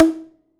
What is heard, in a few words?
Prepared Drums